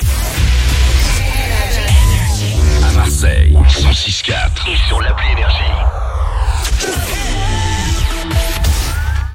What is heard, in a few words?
JINGLE; MARSEILLE, 106.4; NRJ